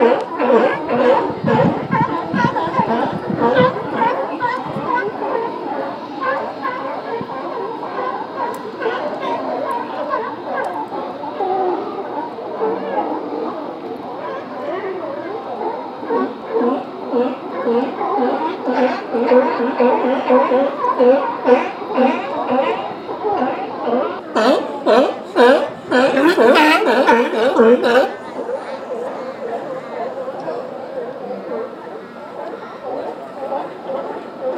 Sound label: seals